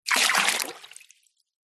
household water pour fast drop into metal bowl; zapsplat

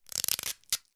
office parcel tape rip from roll

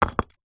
foley brick set down ground; zapsplat

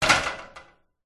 industrial ladder metal hit movement rattle

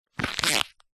household cup plastic empty squeeze crush; zapsplat

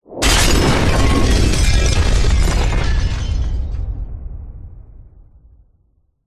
explosion boom big heavy distorted breaking glass

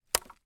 impact small branch twig snap with foot

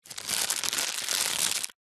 foley plastic pouch small handling; zapsplat

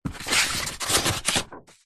household book artists sketch pad page turn; zapsplat

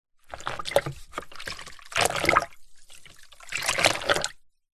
mop into plastic bucket water rinse splosh; household

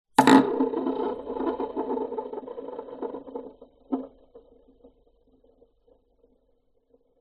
leisure toy spinning top plastic on wood

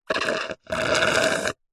food drink milkshake thick suck dregs straw slurp; zapsplat

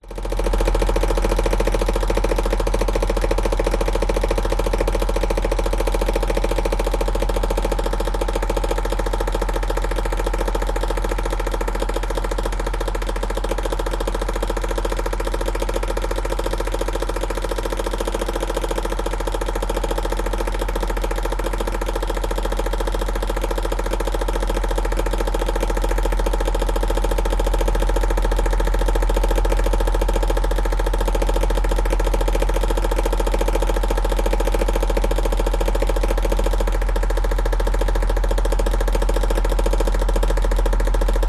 household fan pedestal broken blade hit cage

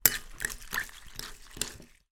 spoon stir cereal in bowl

